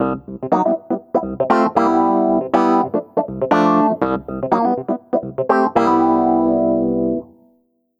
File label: Funky C, keys jazz sample; джазовый